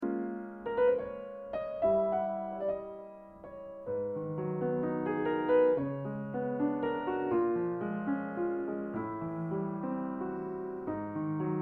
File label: Grotrian Steinweg Concertino, piano